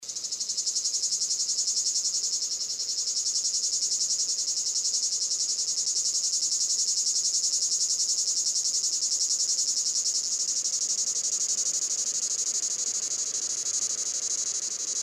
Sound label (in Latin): Lyristes plebejus